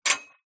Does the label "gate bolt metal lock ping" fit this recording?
Yes